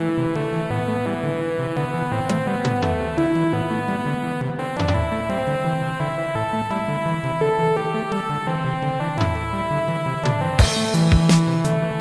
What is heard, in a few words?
LEAD Thick Waves; Synthractive; syntheway